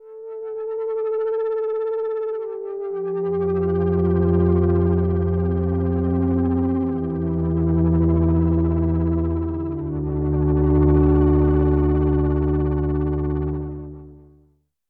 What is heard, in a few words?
LFO Pad